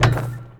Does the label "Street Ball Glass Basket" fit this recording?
Yes